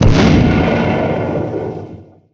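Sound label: Mine explosion; TinyTanks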